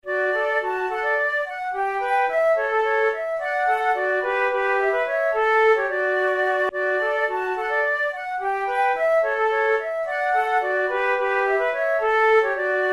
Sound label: duet, flute